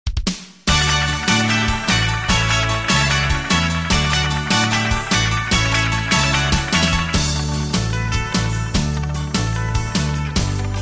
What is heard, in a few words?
D, backing tracks